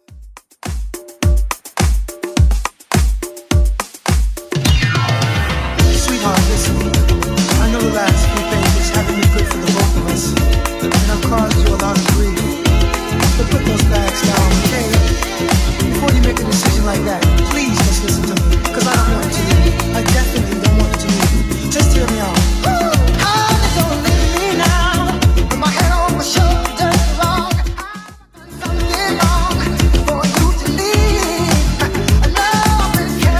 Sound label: 80s Club 105 bpm